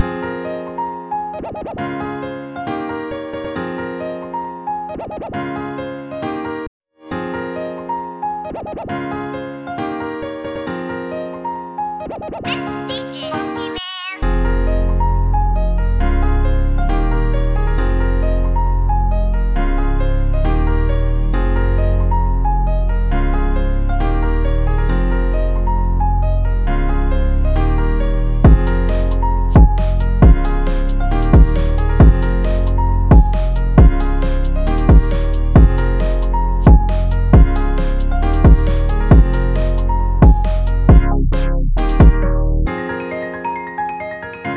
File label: bpm135 Fmin